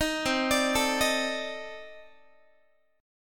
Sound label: C Minor 6th Add 9th C Guitar; Cm6add9 arpeggiated; 18,20,17,19,19,x